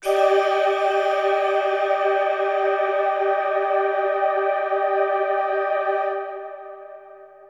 synth pad ambient 160 C; Sphere

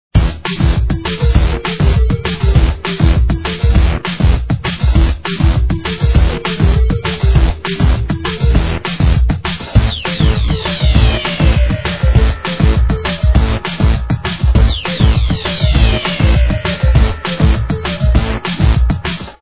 music level; operation graduates

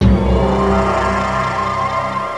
synth

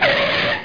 Veer; Sound Sculptor II

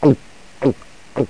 GULP

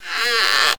Trapdoor; Hamsterball

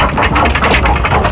winch, doors; Quake; PC Gamer 51

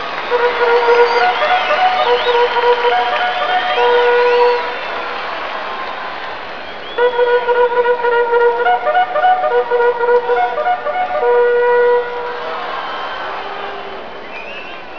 charge

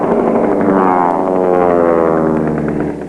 Air Power; America Invades! Demo

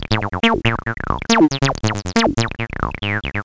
fast bleps; 303tracker

